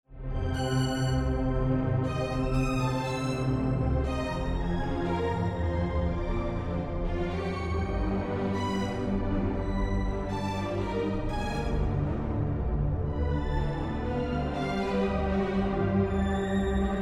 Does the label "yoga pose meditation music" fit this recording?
Yes